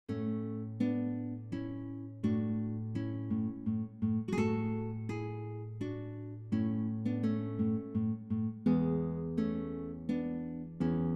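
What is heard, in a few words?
Bagatelles with string quartet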